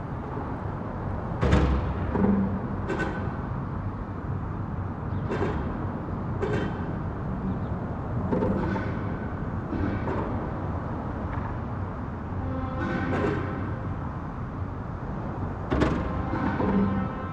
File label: Urban traffic moderate elevated metal plate diverse; urban and suburban; boom library